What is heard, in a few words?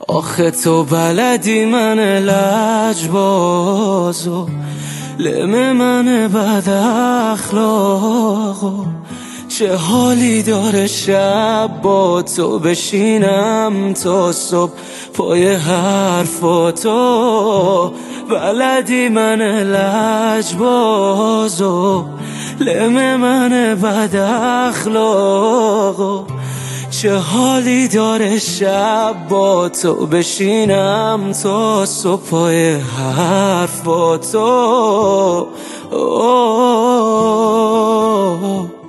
Guitar Version; ورژن گیتار